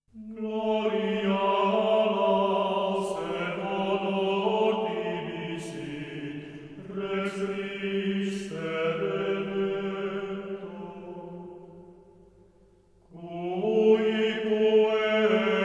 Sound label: Gloria Laus